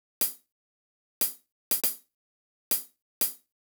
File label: chh inner; hydrogen